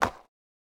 skateboard land light; Smite; Sun Wukong

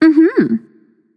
agree, voicepack; smite; pele

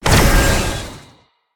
fire; smite; chiron

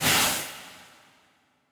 fire impact; smite; zhongkui

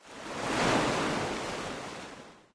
victory waterstep; smite; poseidon

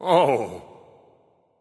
grunt ugh; bacchus

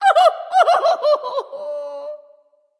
boohoo; smite; nox

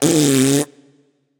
nv tongue; cupid

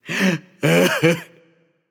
heh heh; smite; kumbhakarna

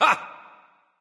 "heh heh"; smite; voicepack; ullr

